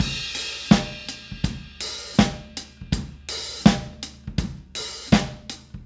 Soul Drums Crash, 70s Analog Funk; Sonic Foundry (Sony Creative Software); What it Is!

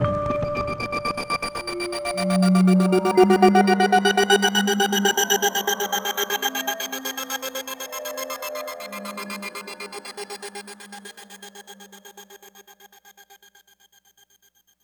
Gated Ambience; SFX; Ambient; Electronic Point Blank

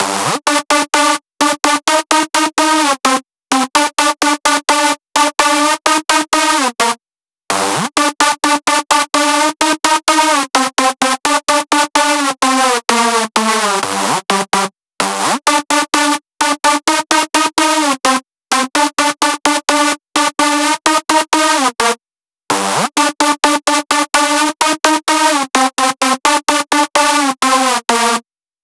128BPM Synth Lead Main Dry noSC, Root G; Vengeance - Essential Clubsounds Vol.5; VEC5 Demo Constr Kits; Early Morning Kit

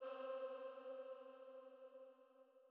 Female Pad C3

